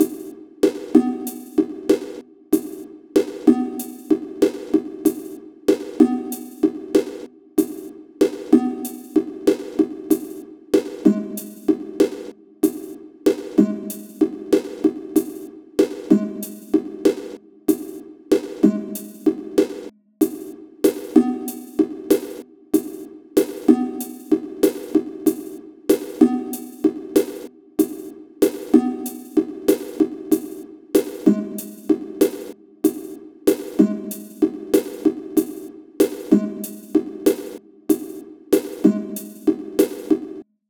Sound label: Sinewave Project